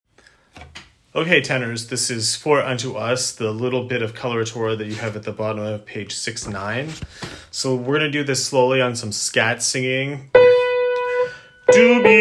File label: For unto us tenors; messiah practice